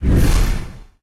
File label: Interface